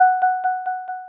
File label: wait pulse; HA-MIC05R2